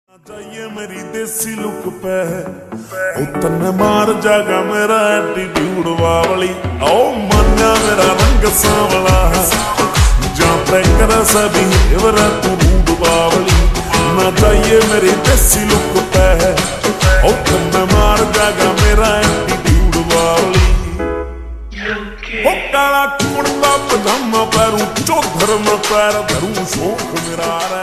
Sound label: Slowed Reverb Ringtone